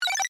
Computer Beeps; Computers; Electronics; BlastWave

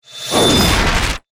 Whoosh To Hit; BlastWave

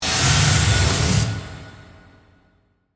Whoosh Swirl Dark, Suction, Accent, Transition; Production Element, Imaging Element; BlastWave

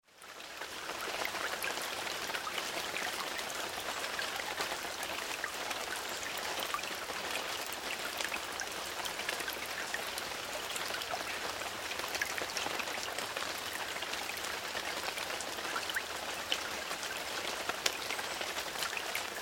Rain onto Foliage; Rain onto Foliage 1 - With trickling brook background; Weather

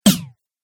Robot Strike Attack; Robot Sound Effects

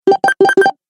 Retro Videogame Fx Bleeps

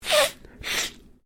MALE SNIFF SHORT DOUBLE, Human male sniffing; Sick

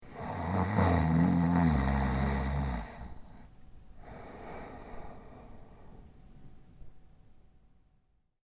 Snoring Male Snore; Snoring Sound Effects; human sound effects; LYN116 package of human sound effects

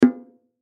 Bongo Hit Low; Drum; Blastwave

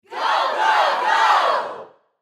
Human Crowd Studio; Crowd Reactions Go Go Go; Sound Effects:Voice

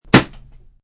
A ballon pops; Pops (Sound Effects:Objects)

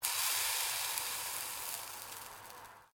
Water Sizzling Hot Pan; Heated water sounds; Kitchen; Household

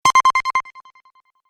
Tap Play Tremble, tapping

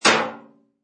Impact Metal; Slightly muffled, short metal impact sound. Hit metal with hammer.

